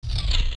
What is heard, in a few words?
Door Stone Close